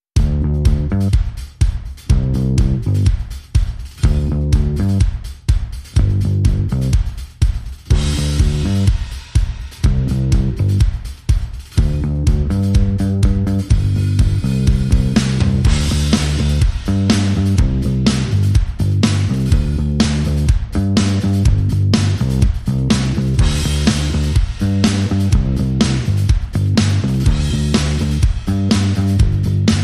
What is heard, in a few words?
Drums and Bass Only